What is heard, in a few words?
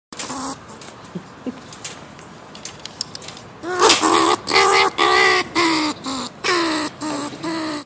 Growling